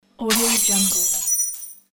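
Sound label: shattered glass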